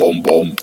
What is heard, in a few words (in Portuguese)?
notificacao moto g4 ringtone